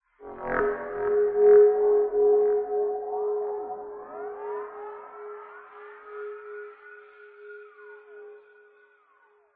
sound design weird burst swell sci fi warped alarm; zapsplat